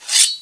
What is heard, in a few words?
Unsheath; SFX; PC Gamer IT CD 38; thief